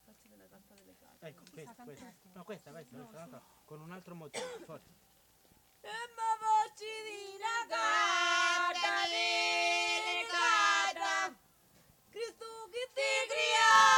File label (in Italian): canto di mietitura; oh facci di na canna dilicata; Cardeto; Mammola; Cinquefrondi